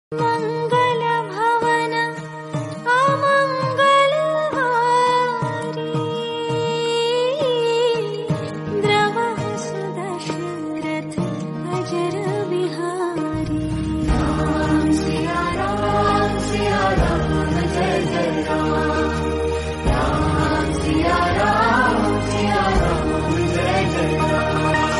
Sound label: Bhajan